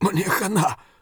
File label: hurt near death; Escape from Tarkov; scav5